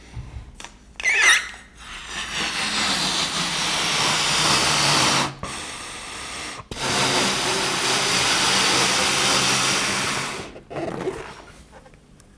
gonflage ballon

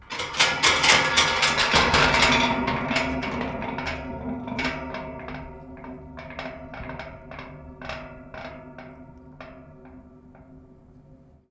Grille Polder Serrrure

